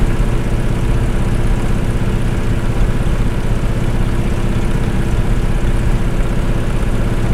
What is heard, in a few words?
hellcat cockpit, engines; War Thunder